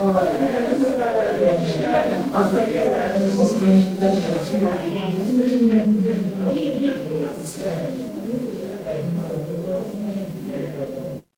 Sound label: crowd murmur